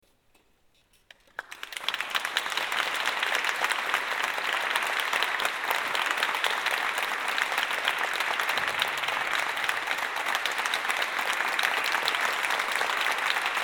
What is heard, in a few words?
Applause; Five Mystical Songs Paris, 28th April 2007